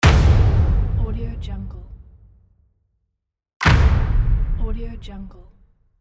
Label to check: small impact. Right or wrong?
Right